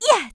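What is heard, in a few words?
voice unbalance; Shua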